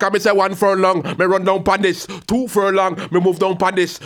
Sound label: G-PHRASE, RAGGA IN G